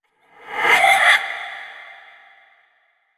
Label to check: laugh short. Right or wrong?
Right